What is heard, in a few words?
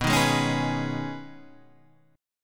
B 6th Add 9th B Guitar Standard, chord; strummed